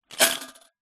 foley money collection tin coins inside shake single; zapsplat